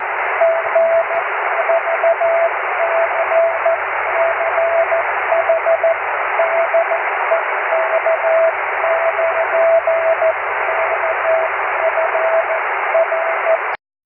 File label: cw; 23cm